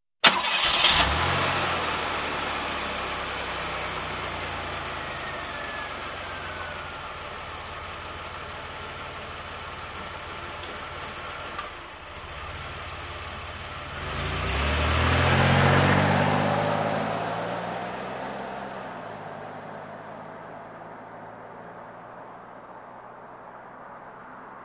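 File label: car starts and drives away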